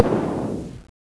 garbage fallen; crack-attack

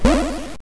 Rail Slider Fire; Io

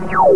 Fire Bullet Single Pulse Sound; freedroid

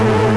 Move Elevator Sound; freedroid

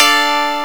Ibanez AM Chord x02210 up; From Aminet

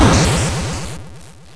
Player Death; Io

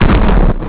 Blast Sound; freedroid

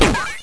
Dragon Hit; Io

